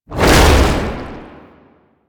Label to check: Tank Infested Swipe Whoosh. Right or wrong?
Right